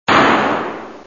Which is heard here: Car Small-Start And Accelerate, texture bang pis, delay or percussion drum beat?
texture bang pis